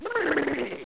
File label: mailbox